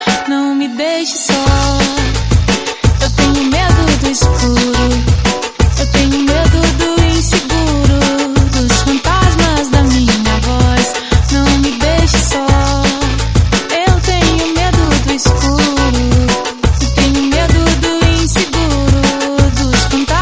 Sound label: electronic drumnbass brasil